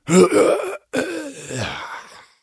Death; Voljin

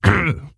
wound; Moorabi

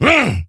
Attack; Ley Guardian Eregos

